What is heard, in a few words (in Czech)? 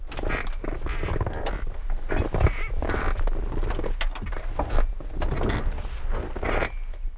nasedání; Mafie 1